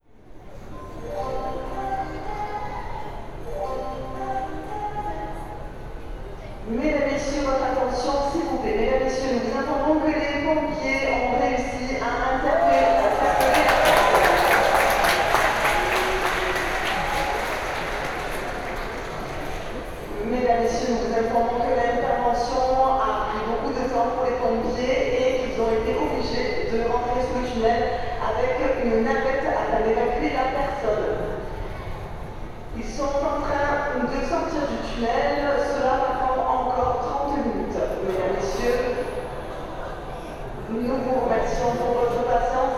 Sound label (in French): gare attente, Gare d'Aix TGV, soir; Gare d'Aix TGV, 4 avril 2022, soir.; On devait prendre le TGV à 17h24 et on était à la gare avec une petite avance de bon aloi. La gare était bondée